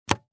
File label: Cassette tape recorder push play button